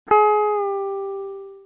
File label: release, egitarre